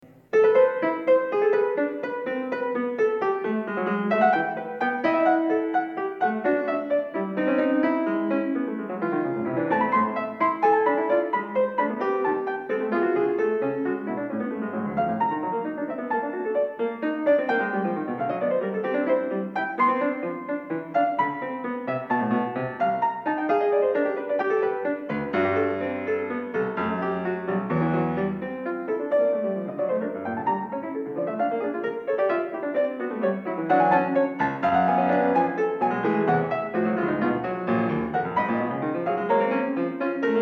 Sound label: Fugue a-moll No. 2; Shostakovich; Preludies and fugue op.87